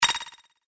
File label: Menu Hover Over, Midi